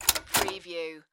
Typewriter Key

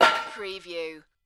Metal Impact - Hit Metal Bar With Rock

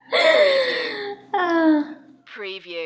Woman Sigh

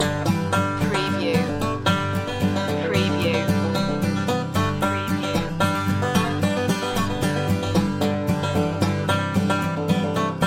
farmyard blues loop

